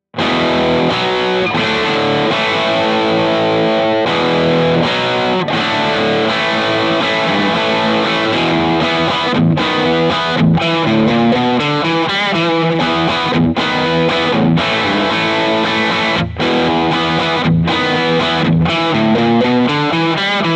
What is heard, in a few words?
rock chords; jensentone